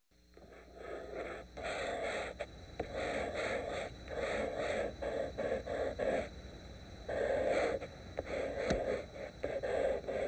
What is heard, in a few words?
ambient